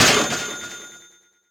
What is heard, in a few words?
register; HeavenStudio; rhythm Tweezers